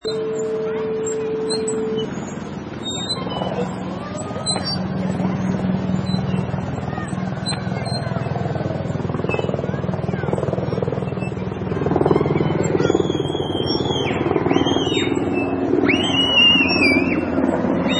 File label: Helicopter over Playground